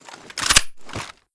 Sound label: draw, mp7